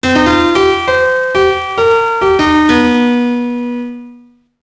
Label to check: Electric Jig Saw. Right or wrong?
Wrong